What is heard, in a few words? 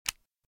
Shotgun - Reload; RadwinIsland